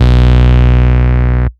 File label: Root B, Ultimate Bass; Vengeance Samples